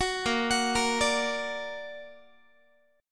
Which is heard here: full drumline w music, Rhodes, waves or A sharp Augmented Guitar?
A sharp Augmented Guitar